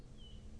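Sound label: Hermit Thrush, nocturnal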